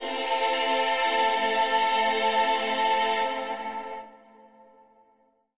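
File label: Slavic Choir A minor Neh Nehhh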